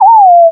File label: Lose